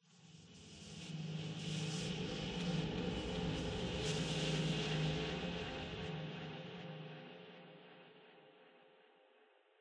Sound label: Npc Combat Noise